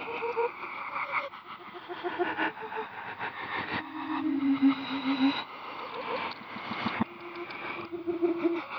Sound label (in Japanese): rei warai; 女系 霊 ６; 霊たちのささやき笑い ループ バックサウンド